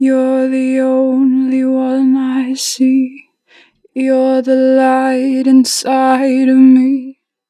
You're the only, 4 bar vib, Vocals, Natural Vibrato; Harmor Vocals